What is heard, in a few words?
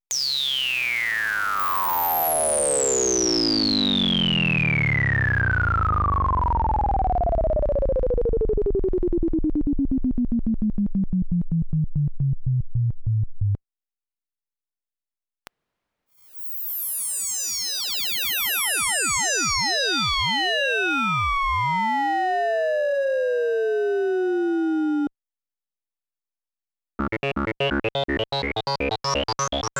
Bass Line 101 fm; TAL